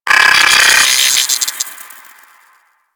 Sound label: Space Drone B Attack G; Warframe; Corpus Space Drone